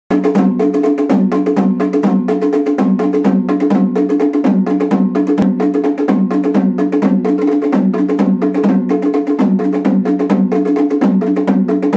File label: deserkoten